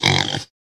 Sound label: Pig grunt and breathe